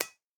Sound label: eoka push barrel; Rust